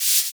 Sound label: Shaker; Equipped Music; Loungin House